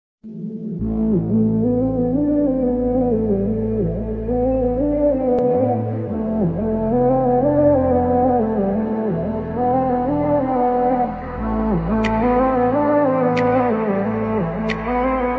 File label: joker ringtone attitude joker ringtone attitude bgm ringtone